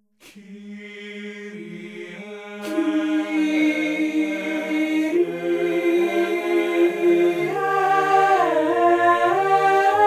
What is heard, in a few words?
alto kyryje